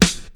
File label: old school loud rap acoustic snare f key; snare-drum-samples